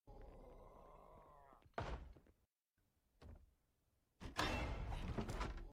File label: scare; zomboid